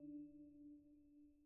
sonar Tail Air Medium Directional; Barotrauma; Real Sonar

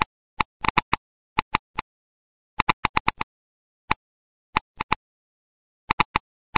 geiger loop; Weapons; EK modern; Barotrauma

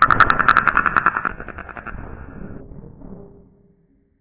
damage; Barotrauma; Barotraumatic; Legacyendworm

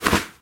pick Item; Barotrauma

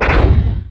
Mudraptor Damage; Barotraumatic; Cthulhu; InsanityMonsters; Hallucination2

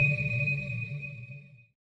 sonar Tail Water Medium Directional; Barotrauma; Real Sonar

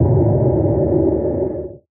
sonar Tail Suit Very Close Shuttle; Barotrauma; Real Sonar

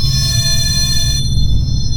sonar Tail Water Close Beacon; Barotrauma; Real Sonar

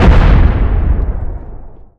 cannon shell; Weapons; ekgunnery; EK modern; Barotrauma

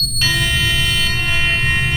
sonar Ping Water Medium Beacon Directional; Barotrauma; Real Sonar

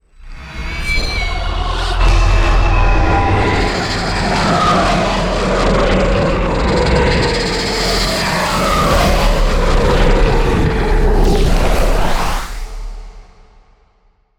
cortizide Injection, Sonar; Barotrauma; Real Sonar

